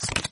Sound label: Card Flip